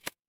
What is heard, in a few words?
ui unlock